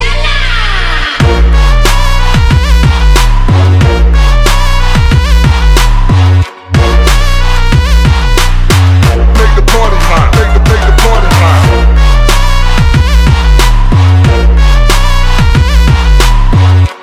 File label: zombie muzik; cs16; cstrike